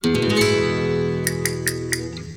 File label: Castanets